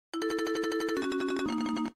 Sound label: marimba slow start